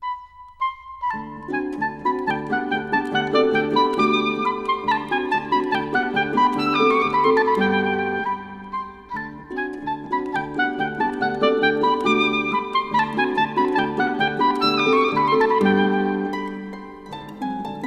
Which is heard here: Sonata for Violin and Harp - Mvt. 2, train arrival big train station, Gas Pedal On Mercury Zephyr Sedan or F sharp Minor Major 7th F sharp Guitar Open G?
Sonata for Violin and Harp - Mvt. 2